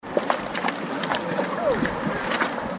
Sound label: Blubber Hot Mud Hole, Iceland